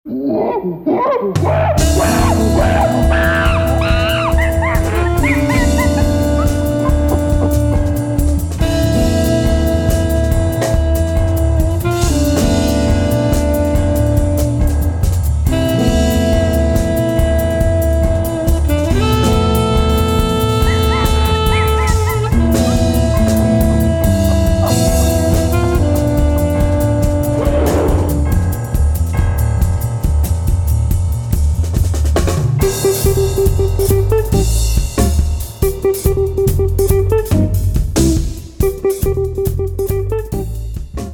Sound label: CHIMPANZEE DANCE